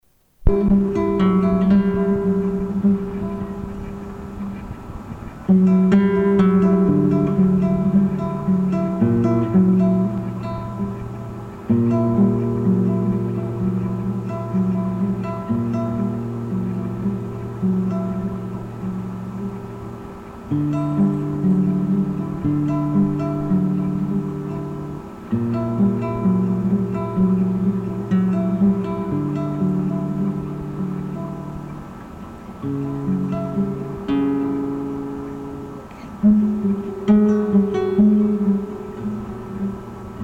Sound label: Guitar